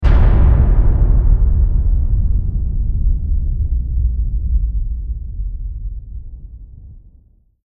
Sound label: Impact Sample